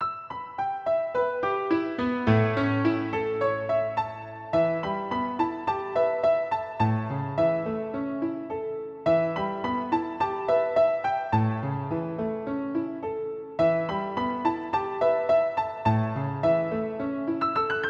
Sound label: calm music acustic